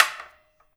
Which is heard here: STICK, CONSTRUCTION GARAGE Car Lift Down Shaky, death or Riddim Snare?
STICK